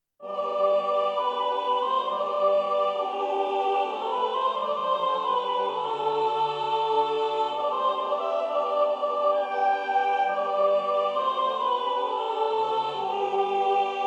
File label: canticorum soprano; Chants